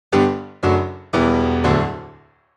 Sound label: Full Release On